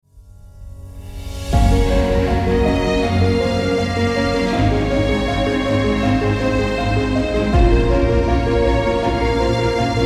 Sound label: Piano And Cello